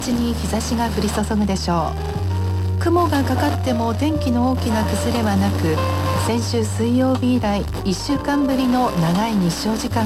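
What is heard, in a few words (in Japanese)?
FMラジオ放送, 81.3MHz, 途中で途切れたりノイズが入りながらも受信できるラジオ放送; J-WAVE(81.3MHz東京スカイツリー・東京都墨田区)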